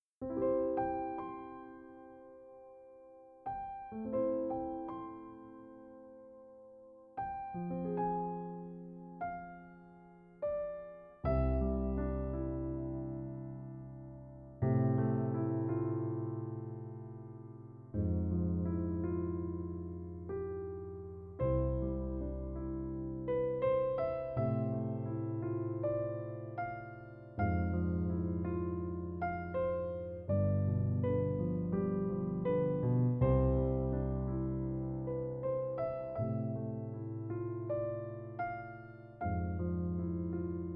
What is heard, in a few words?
Piano; Piano Collection; Katawa Shoujo